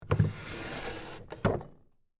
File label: Foley, Drawer, Open, Antique, Wood, Dresser, Small; Drawers